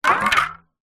foley tin small empty no lid with water in down on ground; zapsplat